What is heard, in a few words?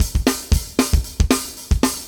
metal